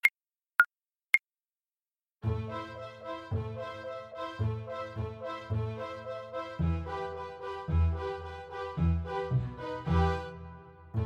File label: turkish march, viola